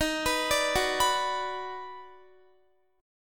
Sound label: Eb Minor Major 7th Sharp 5th Eb down Guitar, chord; EbmM7#5; arpeggiated